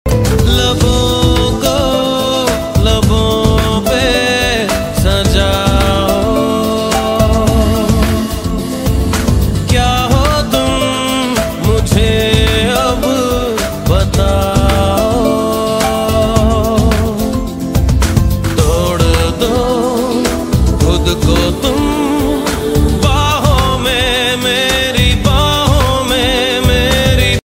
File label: unplugged ringtone